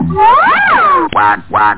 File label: SLIDE; COLOUR IT